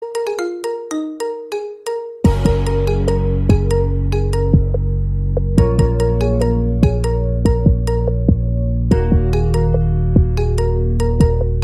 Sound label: Marimba Remix